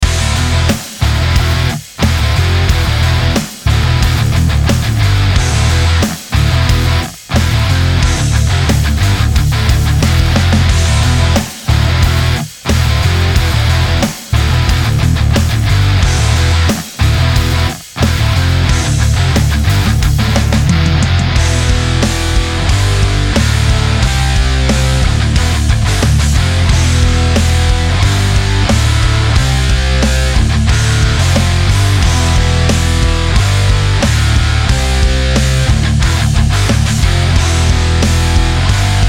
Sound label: Compression Saturation Dry; Fooler; The Fooler is pretty much a one-of-a-kind plugin